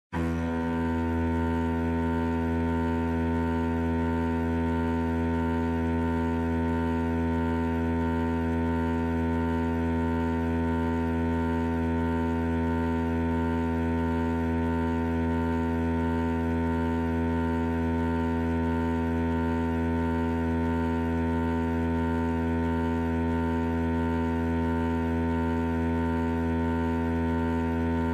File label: drone ringtone